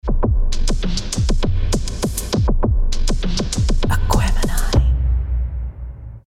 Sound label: Ringtone